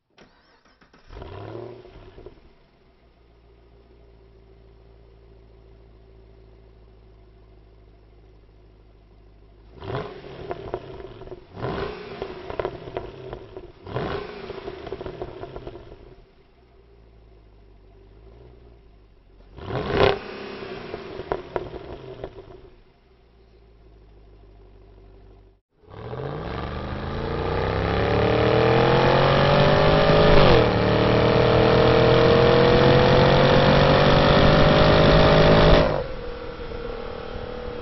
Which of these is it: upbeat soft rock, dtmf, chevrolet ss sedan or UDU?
chevrolet ss sedan